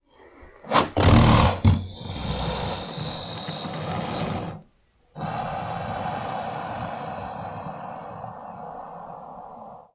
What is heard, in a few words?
Alligator Chuff Brea